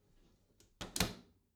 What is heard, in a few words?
bathroom cabinet close regular; objekte; tueren